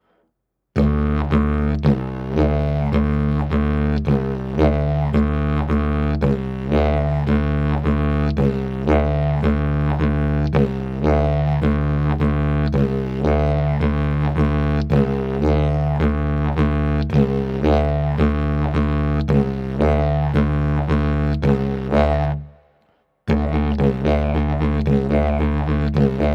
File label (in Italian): ritmo livello principiante